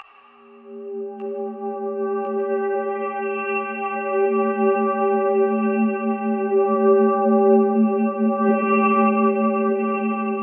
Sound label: atmospheric abduction, pads